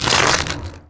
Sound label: fs fm glass, footsteps; Portal 2